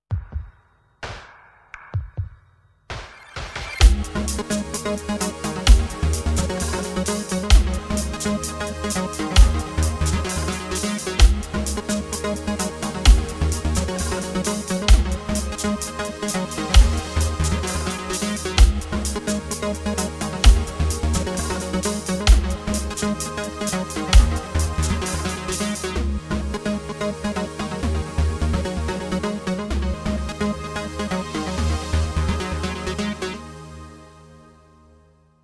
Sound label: High tech